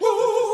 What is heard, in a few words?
"ooh" male sung; ooh ahh